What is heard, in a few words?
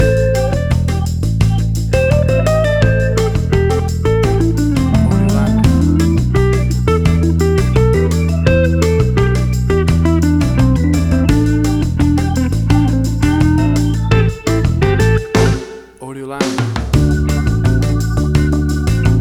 Acid Jazz Fusion Background Music